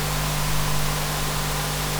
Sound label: Noise; Ableton Live 10 Suite